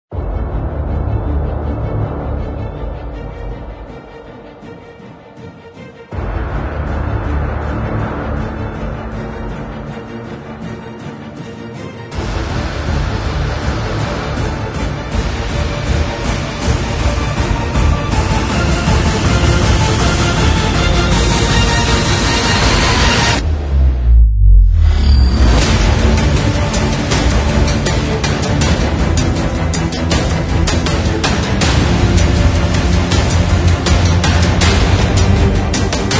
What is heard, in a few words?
Hybrid Trailer Dubstep Version